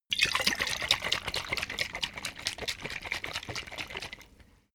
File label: bottle vodka shake fast; berufe; gastronomie